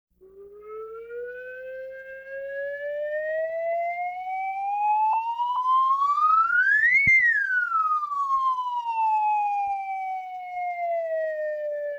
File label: Slide Whistle Long